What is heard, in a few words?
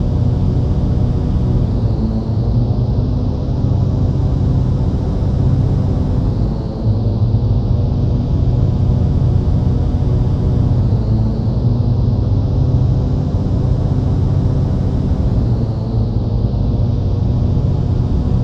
Tunnel Tuning, Ambience; Sonic Foundry (Sony Creative Software); Numina II